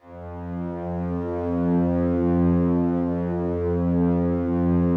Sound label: slow strings F1, Yamaha VP-1; 90 s Sample CDs; Music Store